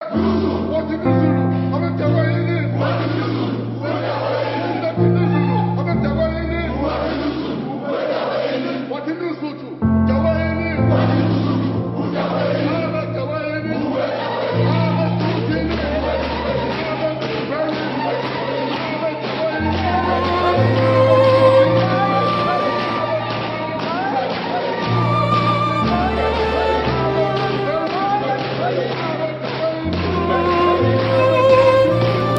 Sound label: War Cry